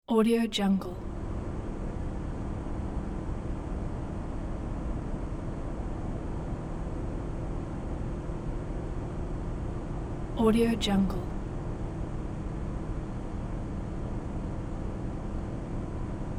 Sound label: empty night city street